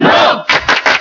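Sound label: Cheer Spanish, crowd; R.O.B.; SSBB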